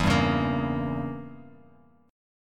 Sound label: E Diminished 7th E, Bass Standard; Edim7 strummed; JGuitar